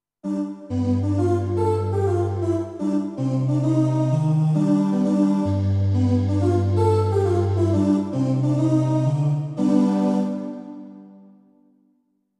Synth Voice

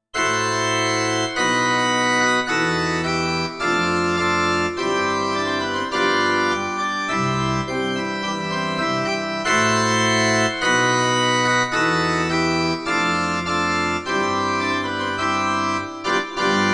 Church Organ

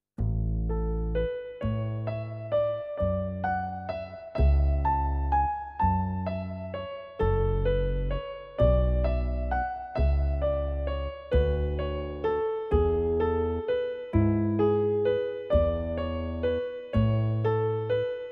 split piano

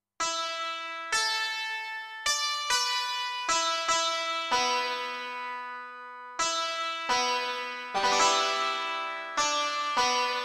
Sitar

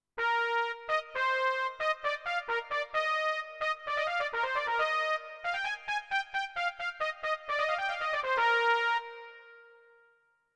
Warm Trumpet

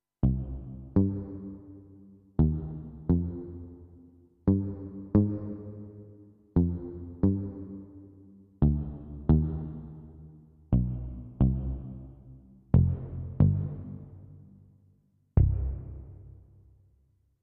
Mute Pick Bass

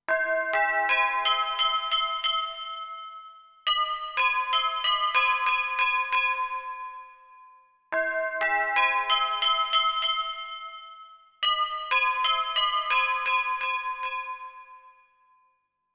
Carillon

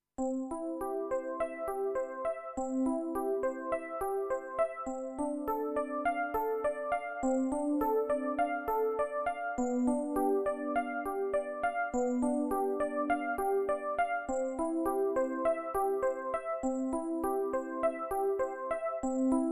EPiano

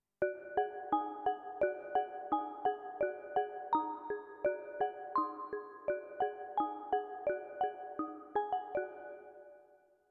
Sine Marimba

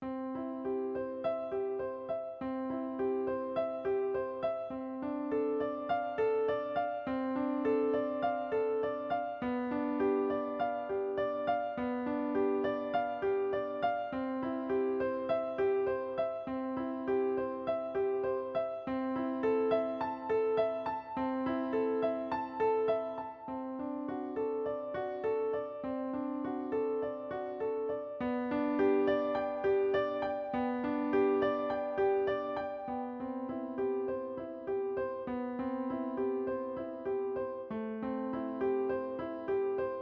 Grand Piano

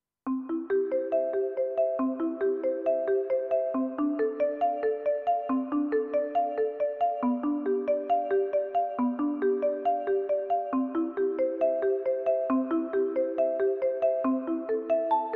Balimba

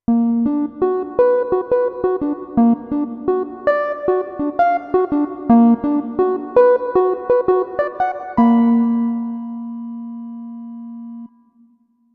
Soft Whirl

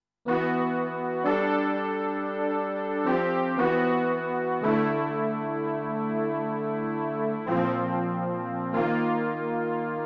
Horn Horchestr

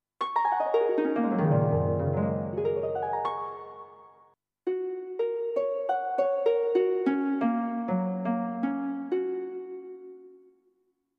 Orch Harp

